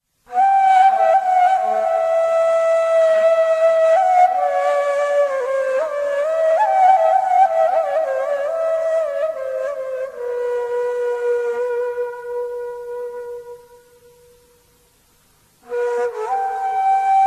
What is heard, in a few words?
Beyati Ney; makam